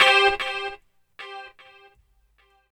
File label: GTR